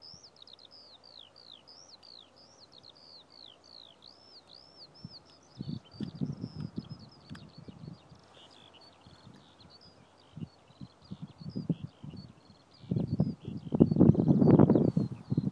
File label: skylark